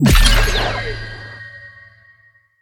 berry heal

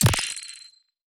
dark hole

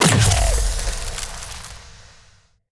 spray gun

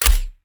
crossbow

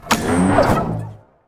car crash

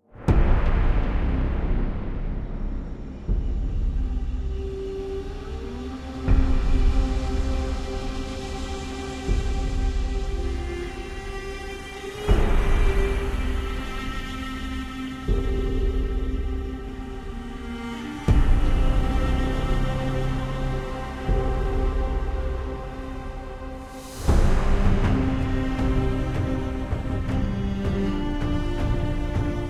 epic loop

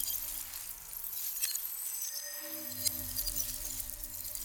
crystal magic loop

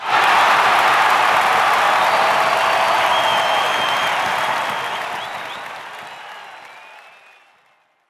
live crowd

